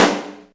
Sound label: Snare, Alesis DM5